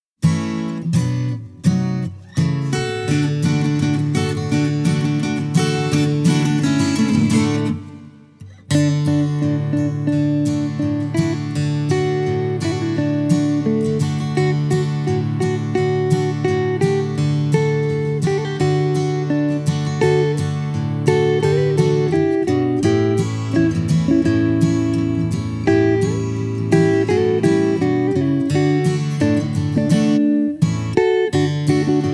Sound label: KARAOKE; folklore; i perdu le do